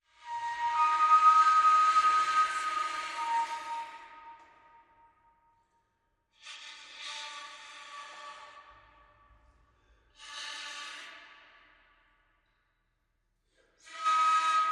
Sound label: Desert Pulse, Flute & Guitar